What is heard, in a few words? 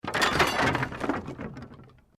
Wood Collapse